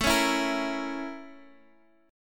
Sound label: A sharp Minor Major 7th Flat 5th A